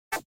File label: melee default